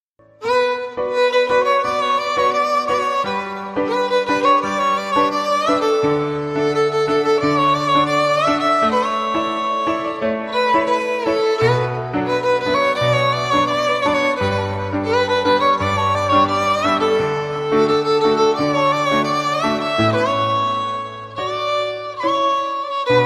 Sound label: violin ringtone